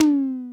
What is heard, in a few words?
Tom High; One Shots; Drums